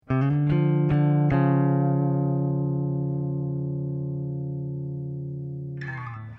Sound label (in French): clair riff; riff son clair